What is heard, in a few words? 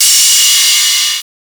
SUPER RIDE, Drums; Distored Reality 1&2